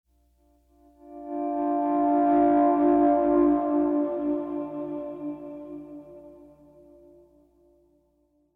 Pleasant Guitar

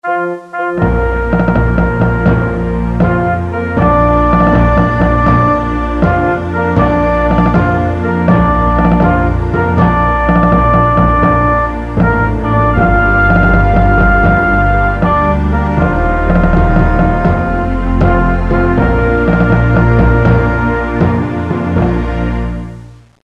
taps; Civil War Generals 2

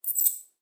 Coins

